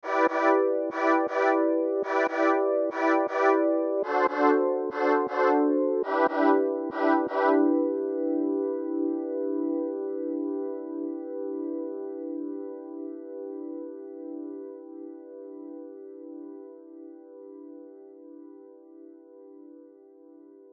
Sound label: retro synth table